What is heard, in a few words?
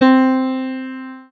piano synt